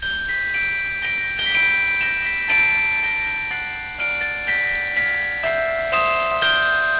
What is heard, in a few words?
carillons, bell; barneveld